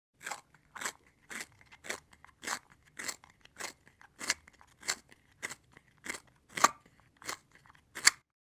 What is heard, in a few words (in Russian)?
zvuk tochilki karandasha